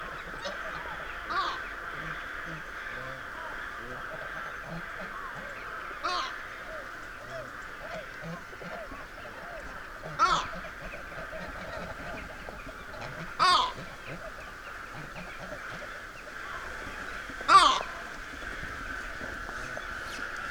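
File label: Eurasian bittern flight call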